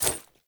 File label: Generic Footstep; Warframe; Uriel